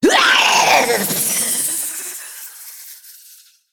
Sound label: female giant snake, Death